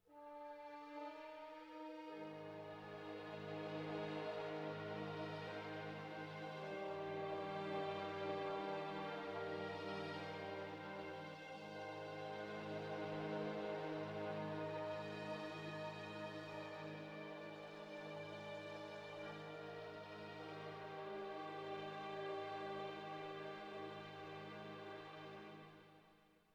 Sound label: horn and strings